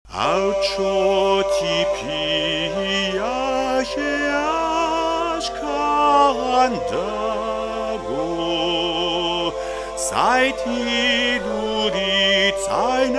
Berceuse basque

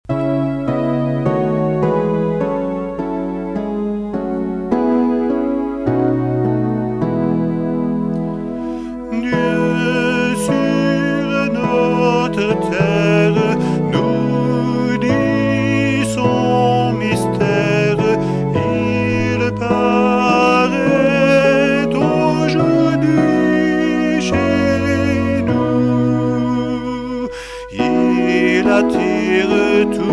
Noel Sicile

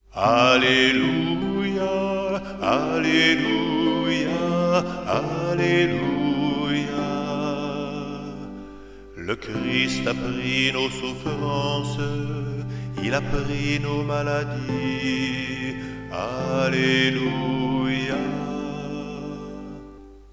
Alleluia pascal

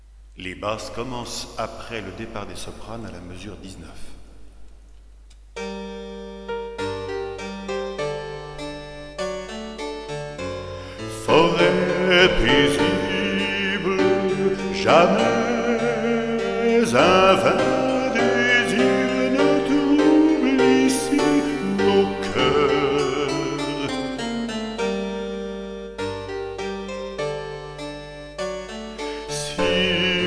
Choeur des sauvages; Rameau

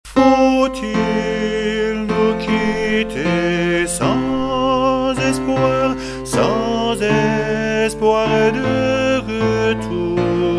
Chant des adieux

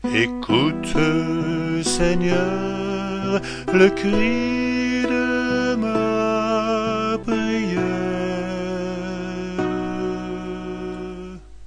Ecoute Seigneur le cri

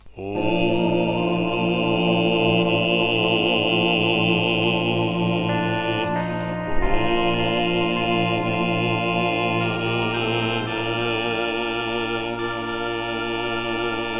Les clochettes

